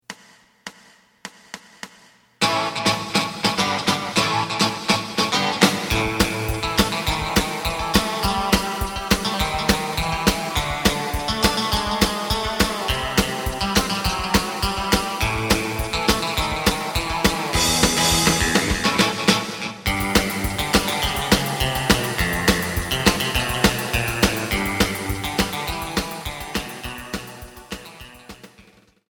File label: bass